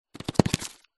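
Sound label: sounds of hamsters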